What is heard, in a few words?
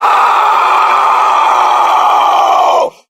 "oh" yell; Voice